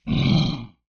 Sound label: grunt, creature